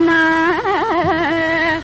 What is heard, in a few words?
Kharaharapriya 2 Shruti G1